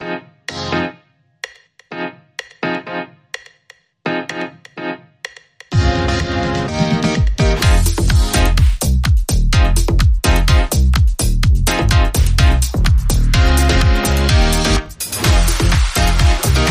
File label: Street Market Swing, Rhythm